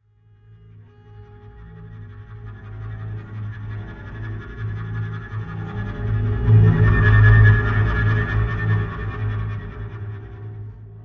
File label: Stingers; Outlast 2; PS4 Windows Xbox One